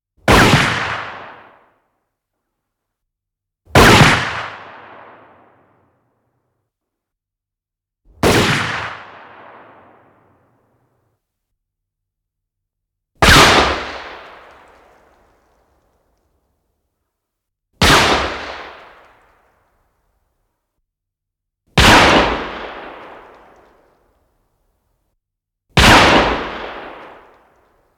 Rifle Single Shots Gunshots Also Ricochets Exterior; Kiv Ri Laukauksia Ulkona